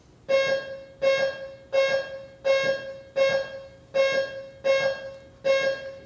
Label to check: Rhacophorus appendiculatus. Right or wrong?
Wrong